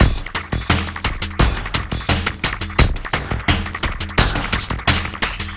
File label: NEURAL NET BEAT; FRENETIC FRACTAL GROOVES